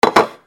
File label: KITCHEN